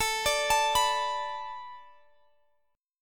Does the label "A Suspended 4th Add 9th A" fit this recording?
Yes